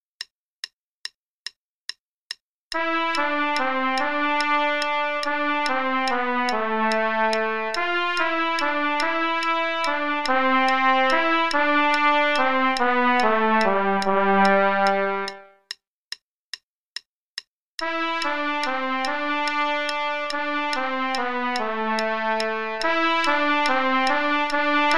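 Play-a-long, in C, trumpet part, quarter note = 144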